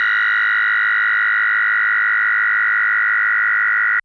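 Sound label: DX100 G#0; rocket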